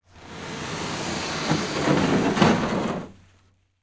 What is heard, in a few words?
rowing boat push water; transportation